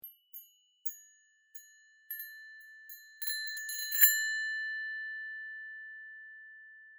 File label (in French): Carillon